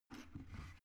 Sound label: plastic water boiler lift up soft; maschinen; wasserkocher